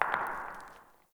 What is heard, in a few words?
cave rock; Ambience; Caves; Glades Of Andurin